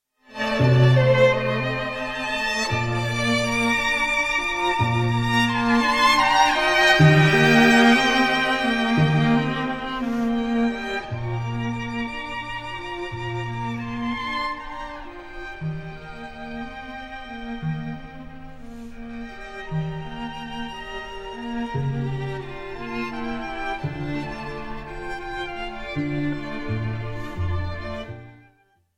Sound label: string quartet in f major; Antonin Dvorak; op 96; American